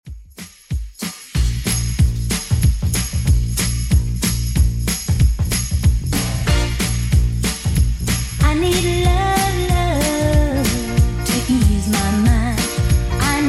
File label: 60s, 187 bpm; Redrum